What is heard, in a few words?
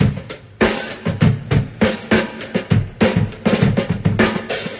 Drum loops, Funky